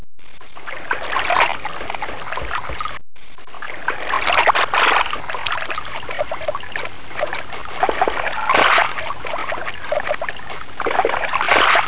shore; Dubnov

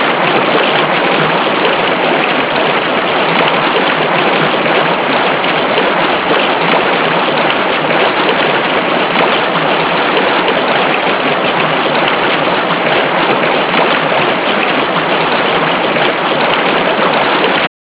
stream

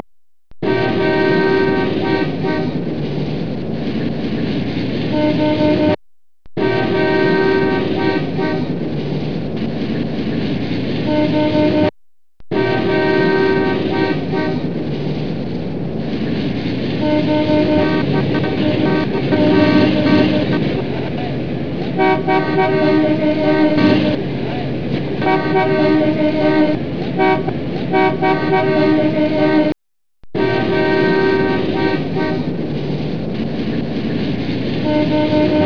traffic jam; Dubnov

